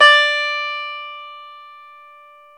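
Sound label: E 12 String guitar; Club-50 - Foundations Roland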